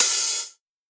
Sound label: Open HH, PsyTrance; Cymbal